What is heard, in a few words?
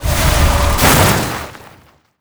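Summoner Frozen Shot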